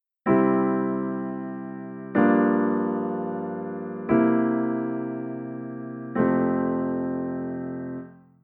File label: Tonic IV extended